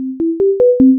sampler

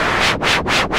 auto Filter

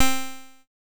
pluck Synth

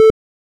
one Pole Lowpass

